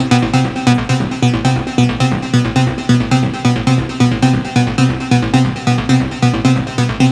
Synth Loop F 135, Techno; Ghosthack; AC2024 - Day 1 - Techno Sounds 2025

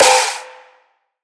Future Bass Snare C; Drums; One Shots

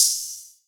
Open Hat, Hip Hop; Drum One Shots; Triangle

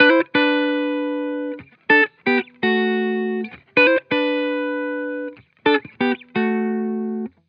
Chill Guitar Loop - 128 BPM G Maj; Vibrations; Cymatics; Vibrations Guitar Sample Pack

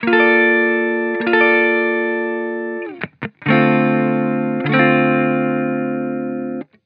Hip Hop Guitar Loop - 140 BPM D Min; Cymatics; Vibrations Guitar Sample Pack

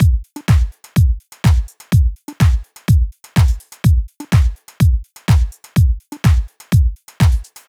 House, 125, Main, Drum; Drums; Loops; Drum Construction Kits; Ghosthack; Drum Mega Bundle; Drum Hero 5

